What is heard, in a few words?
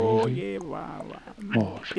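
Music Village Calm Inside; from dust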